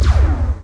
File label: shot; AstroRock Demo